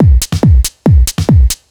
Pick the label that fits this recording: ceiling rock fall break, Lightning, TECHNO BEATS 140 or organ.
TECHNO BEATS 140